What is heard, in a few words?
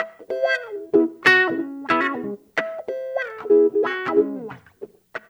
GUITAR, Funky Ass Loops; East West